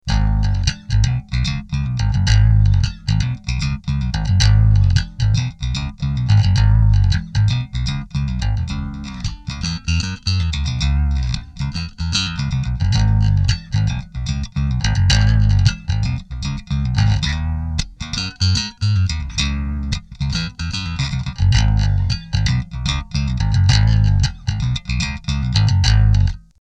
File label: riff